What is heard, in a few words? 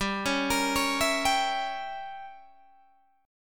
G Diminished 7th, Guitar, Open G